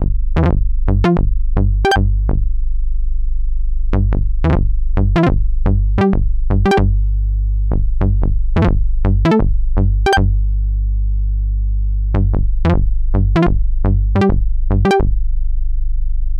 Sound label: Bass TriSaw Seq; DIVA preset